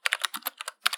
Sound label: keyboard typing